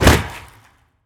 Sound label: strider steps detail; creatures